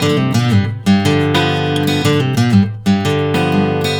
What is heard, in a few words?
BARI RIFF 120 G, Blues Guitar; ILIO; Fretworks